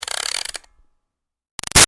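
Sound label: Toy Music Box Wind Up Medium; Toys; BigRoomSound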